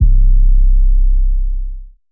808, C; Lofi; Cymatics; Lofi Starter Pack

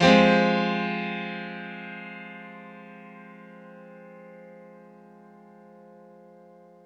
One Shot Piano 140 BPM (F Minor), Grand Piano; Instruments; One Shots; Ghosthack; Guitar And Piano Samples

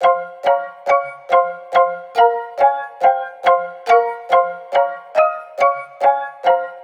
Riddim Melodic Loop F 140 Coded; Ghosthack; Riddim Essentials Volume 2

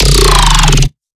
Riddim Bass Hit; One-Shots; Bass Hits; Gnarl; Ghosthack; Riddim Essentials Volume 2

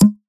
Perc; Cymatics; House Starter Pack

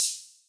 Terror Open Hat, Dubstep; Drums; One Shots; Cymbals; Hihats; Cymatics; Dubstep Starter Pack

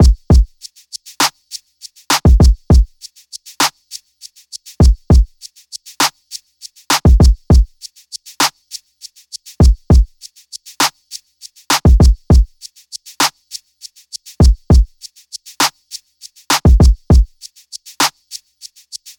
Drum Loop 100 BPM; Escalade; Cymatics; Vibes II Drum Loop Collection

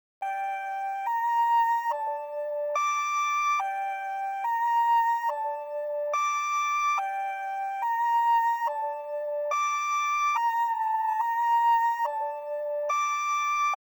142 BPM G Min Vocals, Drill; Cymatics - 2022 Melody Collection - Drill; Peng

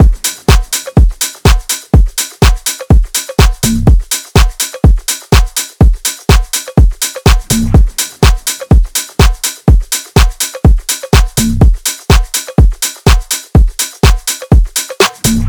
Drum Loop - 124 BPM; Anubis Drum Loop 2; Cymatics

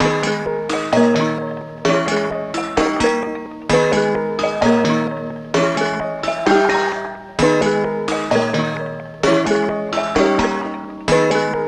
130 BPM G# Min, melody; Louis Vuitton; Cymatics; 2022 Melody Collection Platinum Expansion

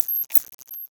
Glitch

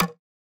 Percussion, Hip Hop; Drums - One Shots; Cymatics - 9God Hip Hop Sample Pack

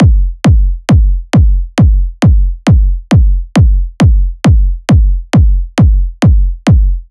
Kick Loop 135, Techno Drums

